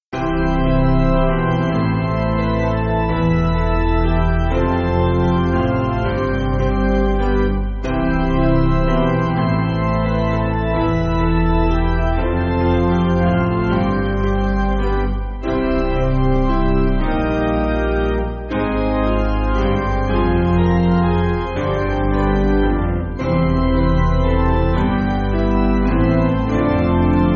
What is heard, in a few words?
Piano